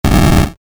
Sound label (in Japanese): 爆発 レトロ; モノラル